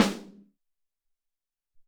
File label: Ring Snr, Alesis SR-18, drums